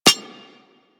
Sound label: Bullet Impact Generic Metal; Surface